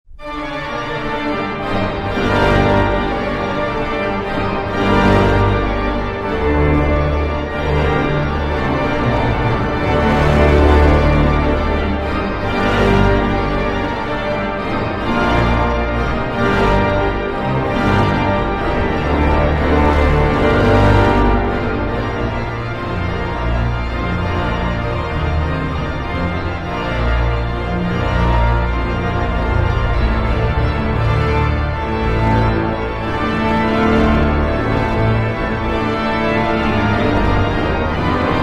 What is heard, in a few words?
fanfare